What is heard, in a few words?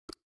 tick; casebattles